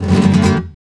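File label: RASGAND G# minor, FLAMENCO Guitars; Spectrasonics; Hans Zimmer Guitars VOL-2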